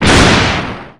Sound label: damage